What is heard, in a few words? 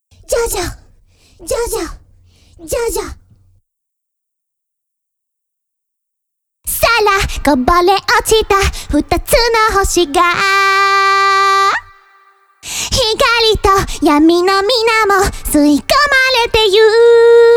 ACAPELLA loli cover